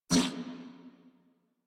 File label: Pain Grineer Male; New War; Narmer